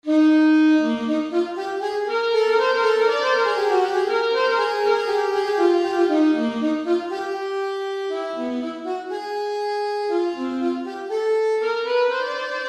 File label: sax audition etude